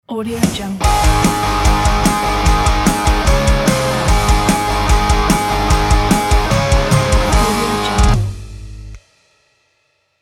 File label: pop rock logo